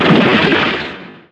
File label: splash; PC Gamer IT CD 47; USAF